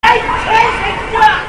dog baby

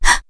jump; freak fortress 2; hat kid

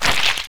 step; silent hill

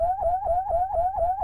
shell spin; tf2ware ultimate; mariokart

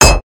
hit; tfdb

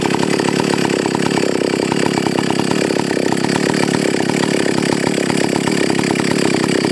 chainsaw loop; barney; slender

